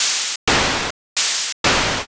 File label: breathing